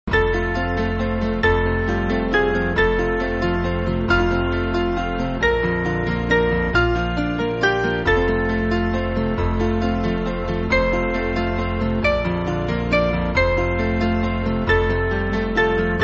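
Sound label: Band, Backing Files for Churches; Upham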